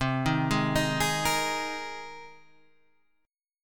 C Minor 7th Sharp 5th C Guitar Half Step Down

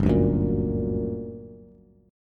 G Minor Major 7th, down, Bass; GmM7 strummed; JGuitar

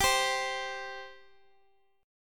C 5th G

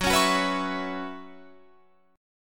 Gb 7th Suspended 4th, Guitar Open F

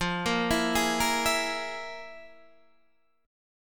F Minor Major 7th Double Flat 5th

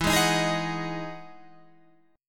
E Major 9th E down Guitar Open G

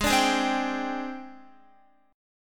Ab Major 7th Flat 5th Ab, down, Guitar; strummed; JGuitar

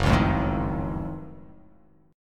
Gb Minor Major 13th, GbmM13 chord; strummed; JGuitar

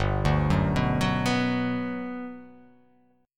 G Major 7th, G, down

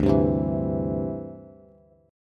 D 7th Suspended 2nd Suspended 4th D, Bass; D7sus2sus4 strummed

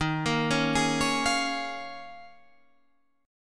Eb 9th Suspended 4th, Guitar, Standard; Eb9sus4; arpeggiated; 11,13,11,13,14,13; JGuitar

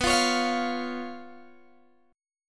B Suspended 2nd Flat 5th down Guitar Open G

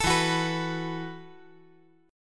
E Suspended 2nd Suspended 4th; 14,16,14,14

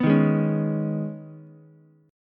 C sharp 7th Suspended 2nd, C sharp, down, Ukulele; strummed; chord

